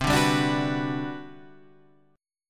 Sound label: G Major 7th, C, Gmaj7/C chord; strummed; JGuitar